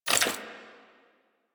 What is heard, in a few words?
Generic Footstep; Warframe; Dagath